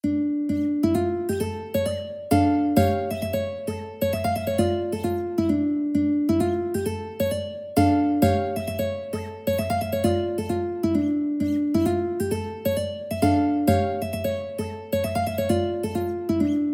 mandolin

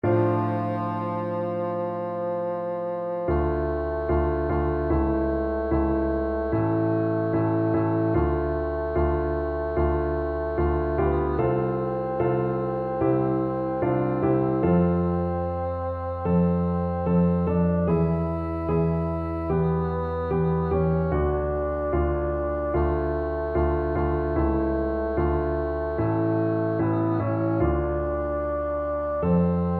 beethoven 7 2nd, bassoon

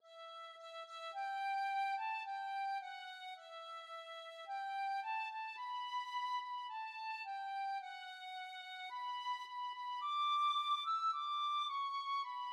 deus salutis, tin whistle

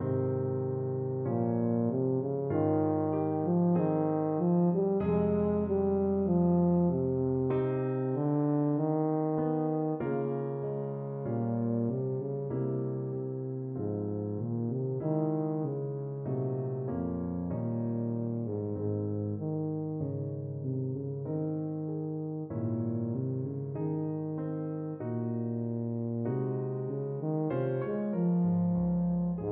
trysting place, tuba

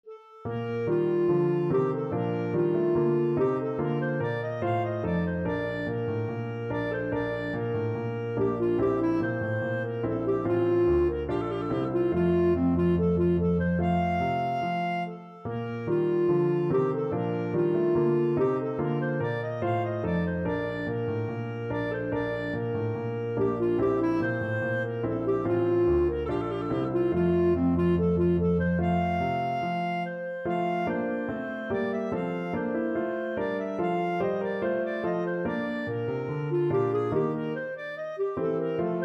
march in d clarinet; bach bwv anh 122

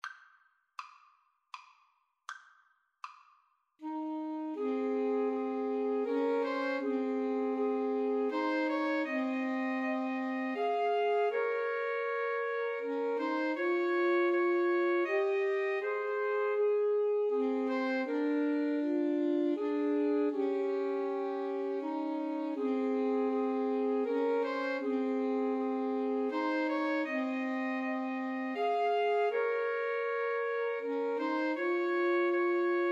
Away in a Manger, Alto Sax Trio

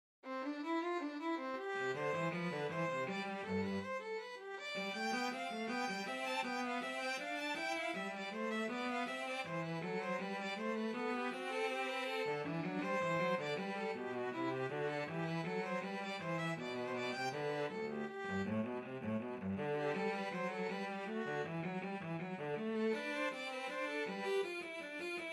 violin cello duet

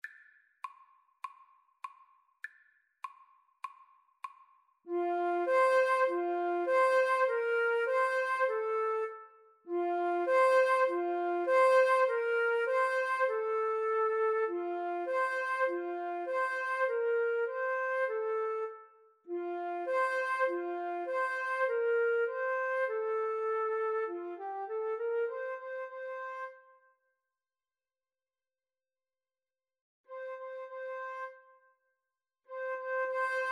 Flute Duet

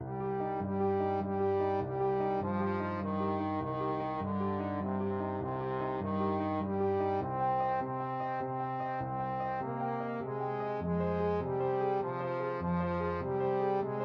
spanish romance, trombone

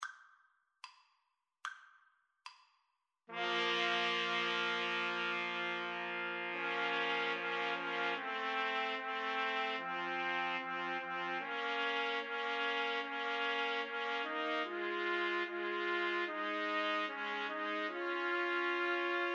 beethoven 7 2nd, Trumpet Trio

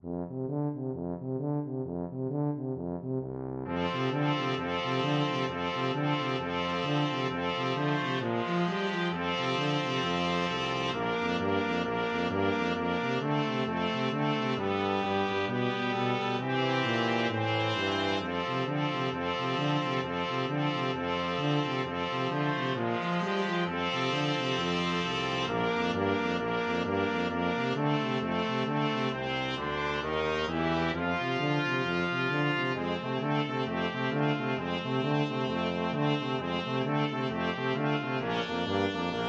boogie BRASS,brass quartet; jingle bells

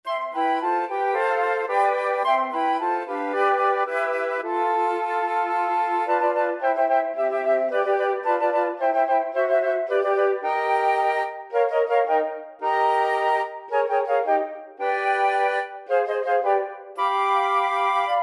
flute quartet

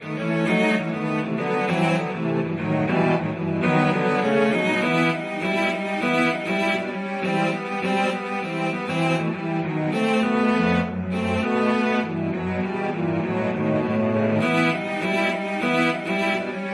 cello trio

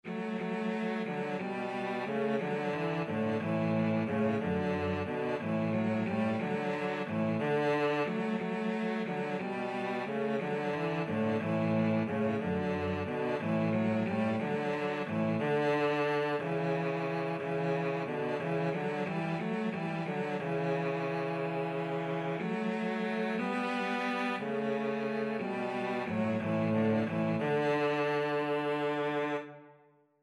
carol, cello duet

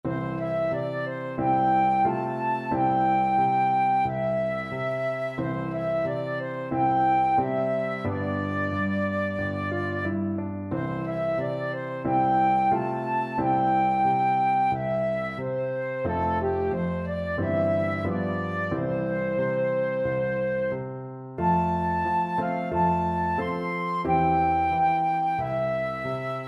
flute

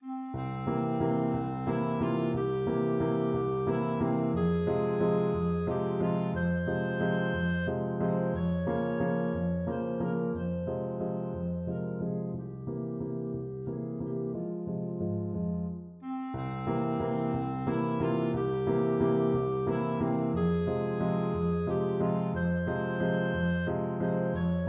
anniversary danube, clarinet

